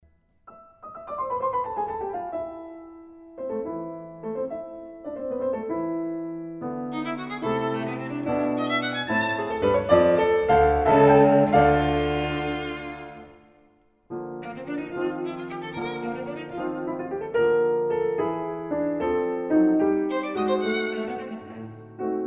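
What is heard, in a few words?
piano trio; dobrzynski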